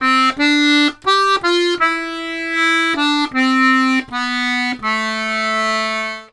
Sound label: Complete Accordions; USB Soundscan vol.40; AKAI